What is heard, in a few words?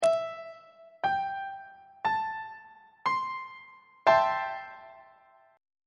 g double sharp minor 7th 2nd inversion on treble clef